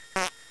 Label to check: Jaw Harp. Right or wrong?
Wrong